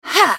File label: effort; Ahri